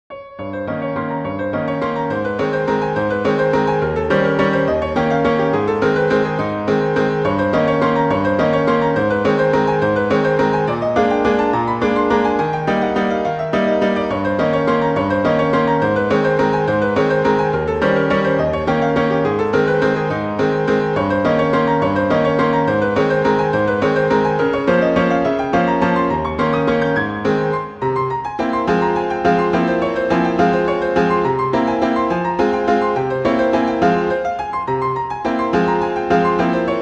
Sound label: Waltz in Ab major; Chopin; Waltzes; Op posthumous